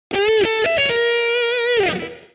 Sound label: scale bend; fretjam